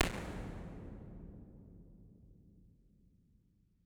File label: Sound Aula